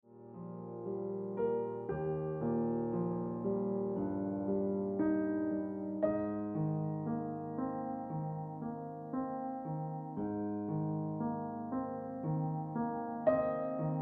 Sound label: Ringtone Piano Music